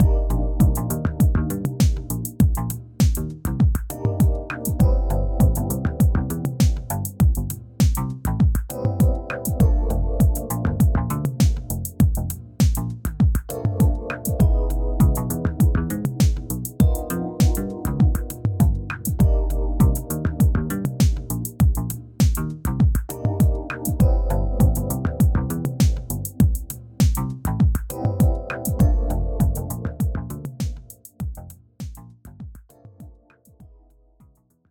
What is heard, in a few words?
rhodes